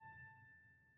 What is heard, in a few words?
piano harmonics, A5